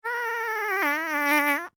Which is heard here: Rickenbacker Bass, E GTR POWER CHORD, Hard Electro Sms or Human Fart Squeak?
Human Fart Squeak